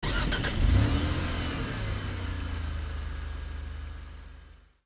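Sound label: car engine starting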